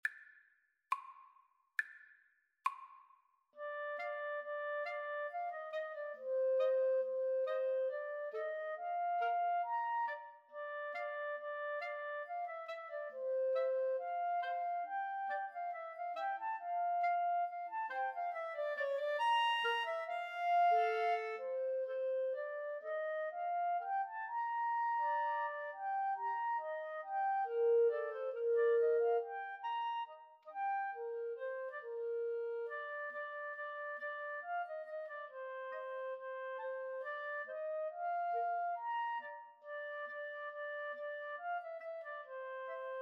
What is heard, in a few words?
Clarinet Trio